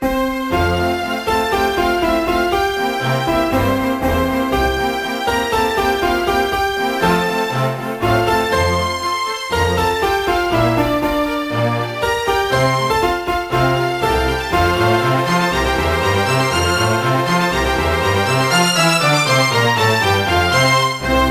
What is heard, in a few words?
BGM ORCHESTRA